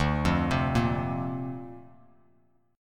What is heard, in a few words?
D-Minor 7th Sharp 5th D Bass Standard, chord; Dm7#5; arpeggiated